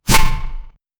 sound effect, block

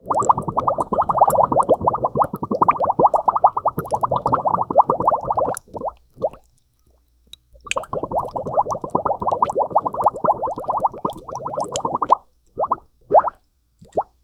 bubble sounds

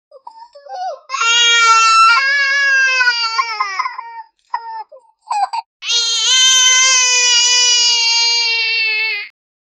child crying

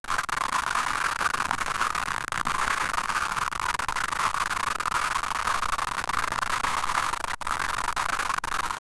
distorted screech in the; distortion

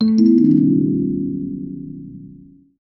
a quick falling xylophone; game audio

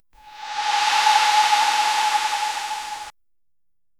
soft fluid swoosh sound

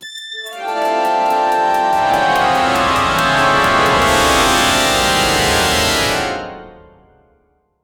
a three second triumphant, fanfare

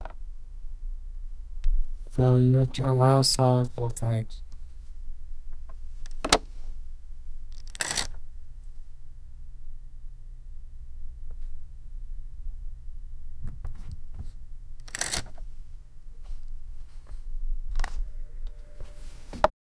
record player turning, needle drop

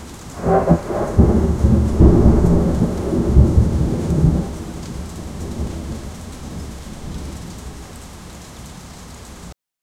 thunder rumbles as ravens; birds

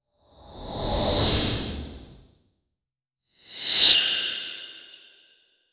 sensual sound of violent; aggressive

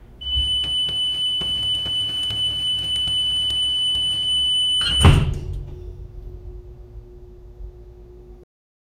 a subway door closing sound

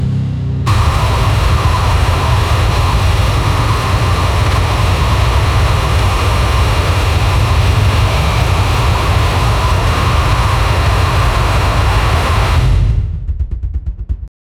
402, engine

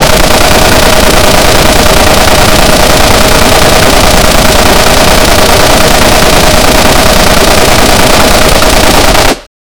gun

